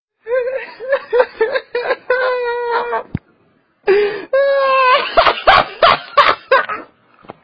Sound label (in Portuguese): rindo